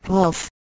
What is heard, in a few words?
pulse